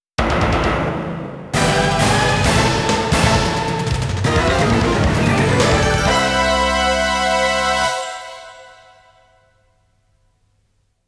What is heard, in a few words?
Stage Clear; Fighter Street; turbografx 16